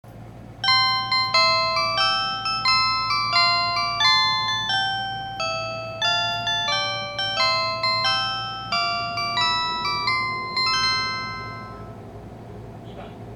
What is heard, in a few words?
183 chime; higashinihon